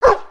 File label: dog bark